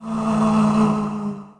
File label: ghost